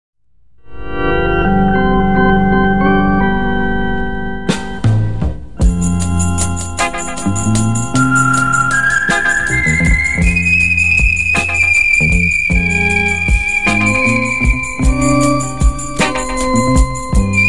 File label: Whistle; Free Sound Orchestra; Loves Whistle